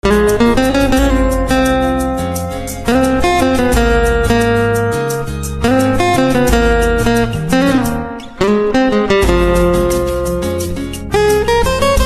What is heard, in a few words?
Guitar Instrumental Ringtone